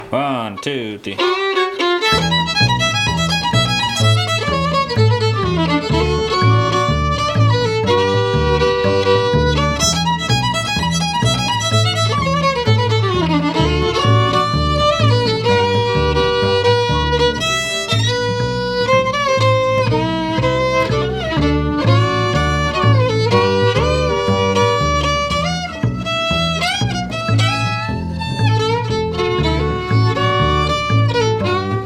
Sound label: Fid Bass AMP